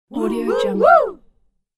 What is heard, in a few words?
cartoon ladies voices win fanfare